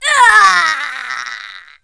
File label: death; alienarena; invader